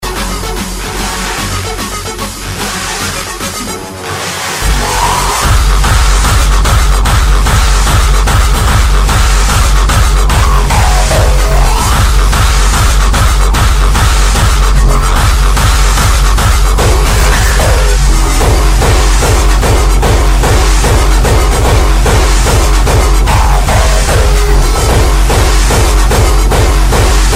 hardstyle

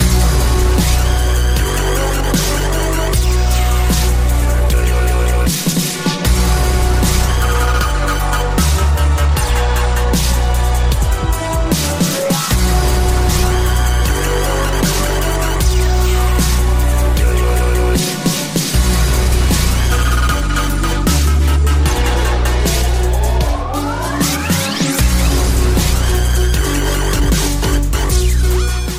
dubstep symphonic; ringtone

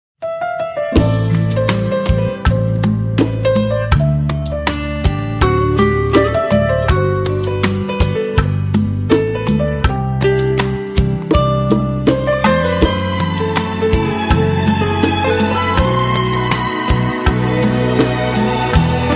instrumental

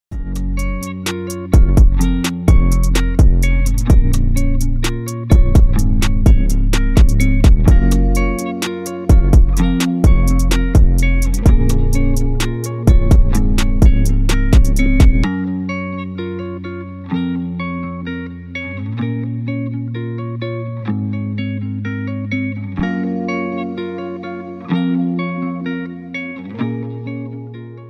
instrumental

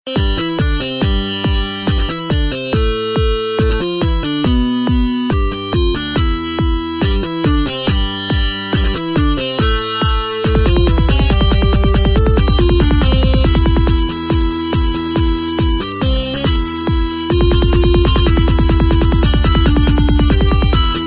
jingle bells commodore 64

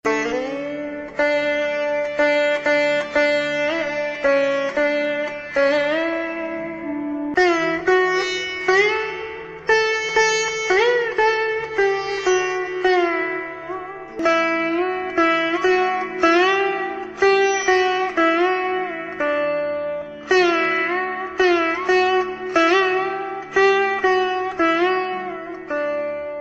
instrumental

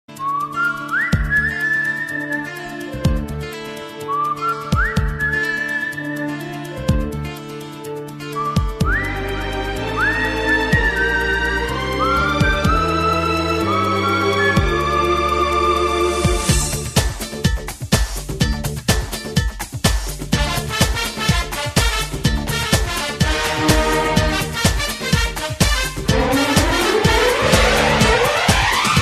nazar nazar whistle

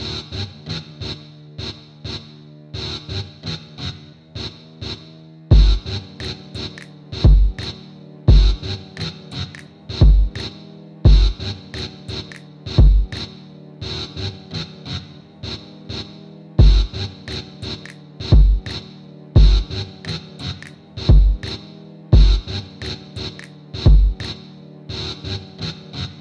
beat

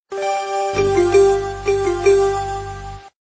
alert, ringtone; ESPN

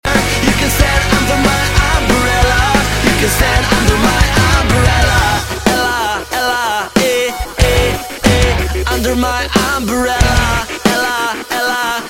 rock version

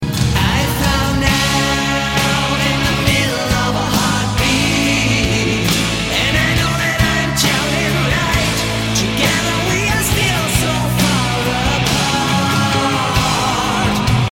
metal ballad

